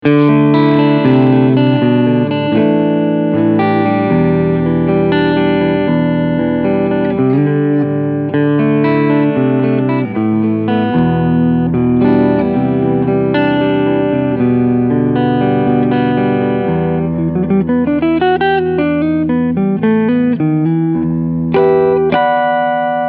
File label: Cleanpicking Front HB